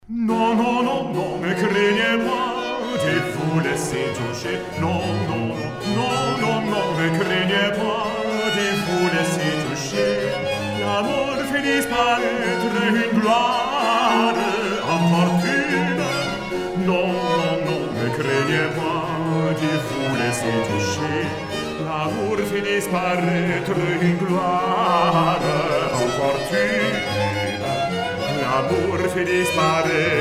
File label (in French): Air; Jupiter et Europe